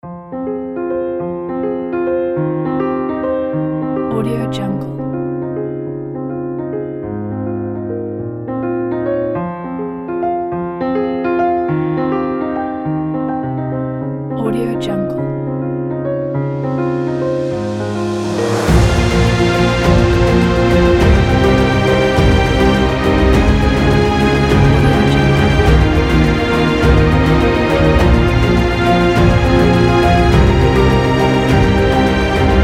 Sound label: uplifting emotional inspiring strings kit